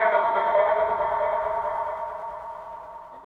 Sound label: techno vocals 140